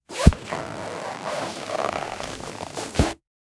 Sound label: clothing